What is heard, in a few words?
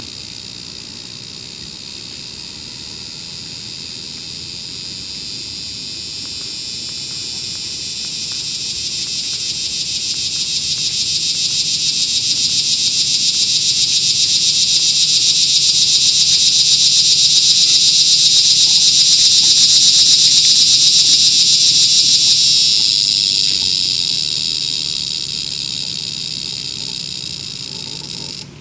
T linnei, cicadas